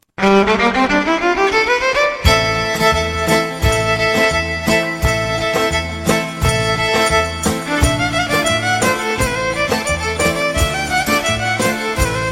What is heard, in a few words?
Ikariotikos dance